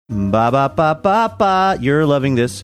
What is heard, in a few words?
singing jingle; mcdonalds